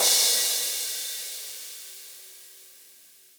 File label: drum hit finish